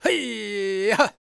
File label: powwow, World Voices, Lakota; Spectrasonics Vocal Planet CD5